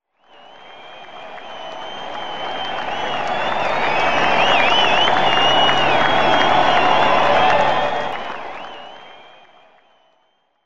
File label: catcheer